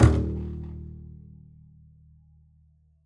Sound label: pizz eb1, double bass; dsmolken